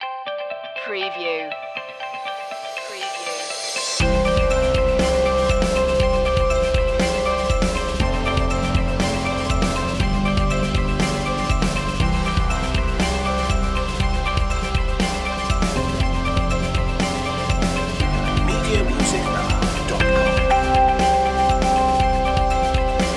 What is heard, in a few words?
corporate innovations